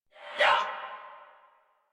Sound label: Attack Effort; Warframe; Dax; Ranged